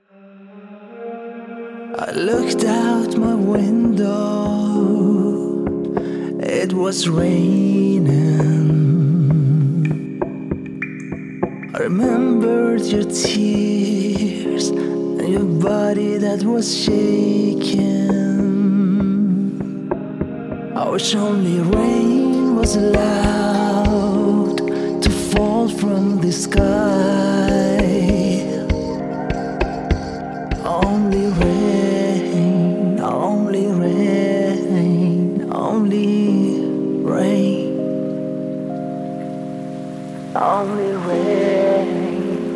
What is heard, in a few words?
Only Rain